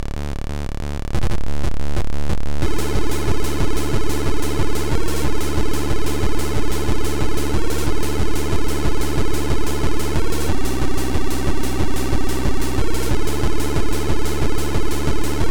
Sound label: TechnoTrance; Imperio